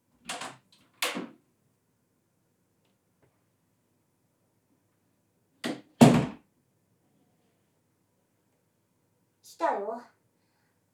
アイスペロペロ・指舐めチュパ音練習

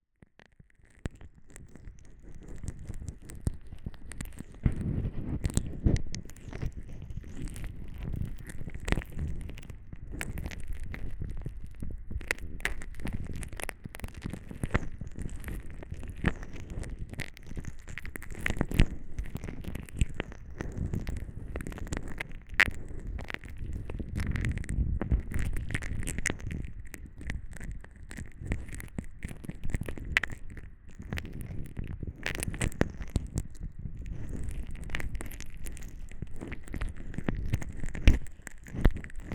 スライム耳かき (両耳同時), 声なし; スライム娘ちゃんの出張リラクゼーションサロン！?

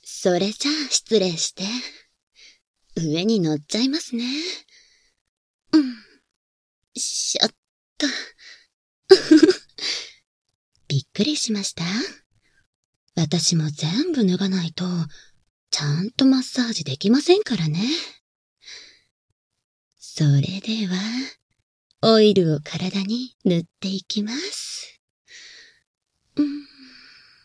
オイルマッサージ, ASMR

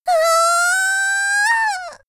絶頂「あーー！」; 綾波系少女

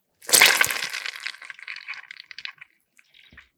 射精音

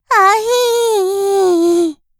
あひぃ～

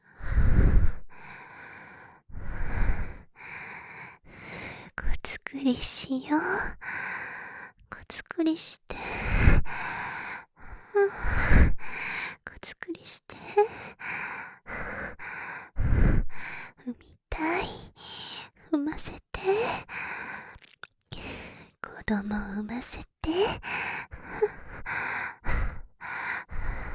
喘ぎ

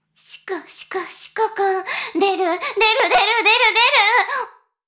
しこしこ音声; 少尉; 牧場戦腺

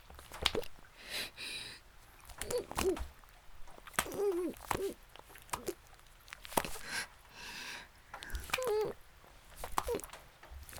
雨降りバージョン ギシアンループ; 田舎の別の無感情少女と自己犠牲愛

